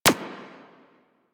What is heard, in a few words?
Decoy Counter Measure Shot A; Warframe; Vehicles; RailJack; RailJack Weapons